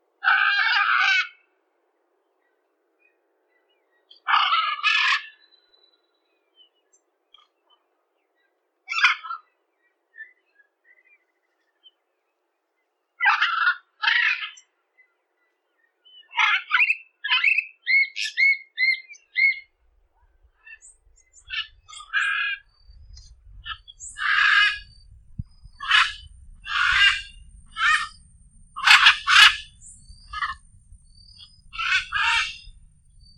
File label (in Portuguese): Canto da Arara Caninde